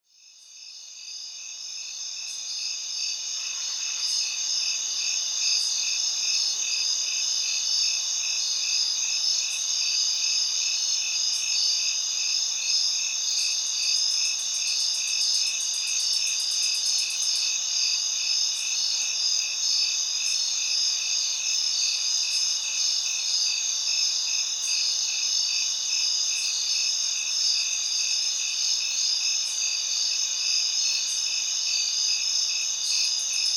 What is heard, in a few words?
Crickets; Richdale